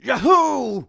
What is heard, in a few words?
yahoo; foreman spike; SM64 CO-OP